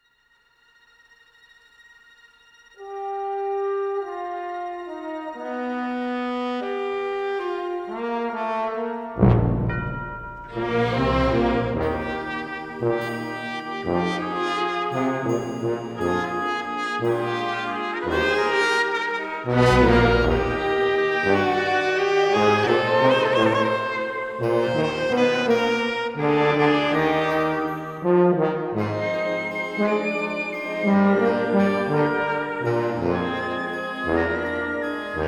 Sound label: Barkarola